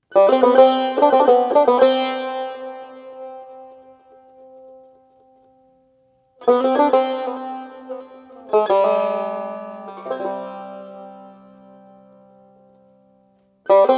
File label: Taknavaziye Tar (Tar Solo)